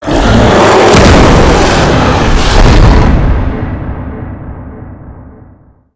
roar; slender; quadralex

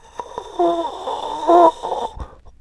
animatron breath; slender; fivenights common

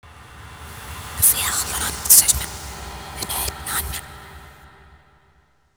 reversed; slender

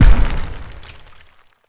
garg close step; slender

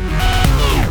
melee frying pan; tron mod; weapons

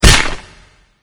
chomp; slender; hellknight

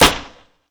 garg claw; slender

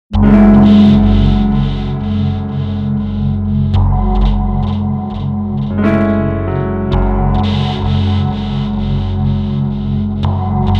dispenser idle; tron mod; weapons

